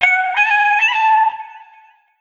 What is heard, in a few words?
Vocal Sample Pack Phrases A; Slate Digital Omnivox Vocal Sample Pack